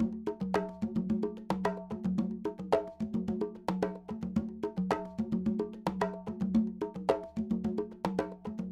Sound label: Conga Groove, Latin Percussion; Sonic Foundry (Sony Creative Software); Joe Vitale Latin Percussion; Grooves